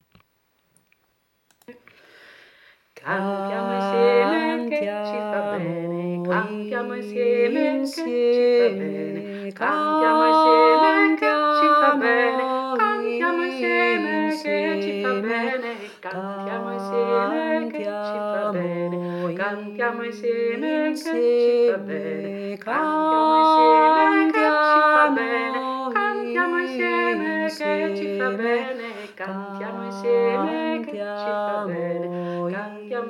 cantiamo 2stimmig

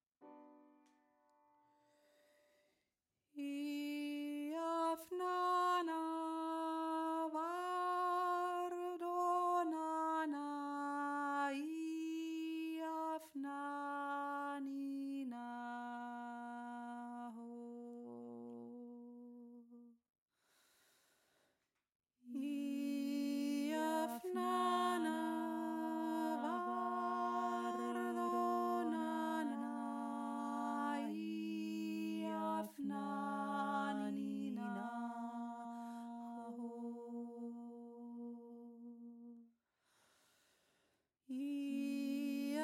heillied obere stimme; iavnana

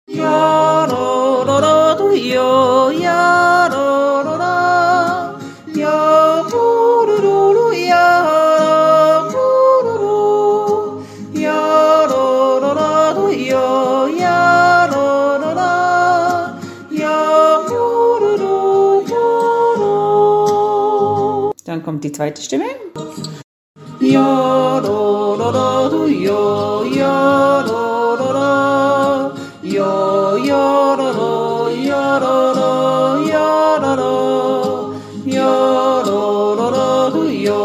einzelstimmen

